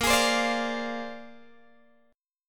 Bb 9th Bb down Guitar Open G

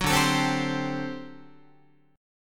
D 11th D, down, Guitar; D11 strummed; JGuitar

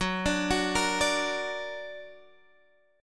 Gb Augmented, Guitar, Standard; arpeggiated; capo 2; 14,17,16,15,15,x

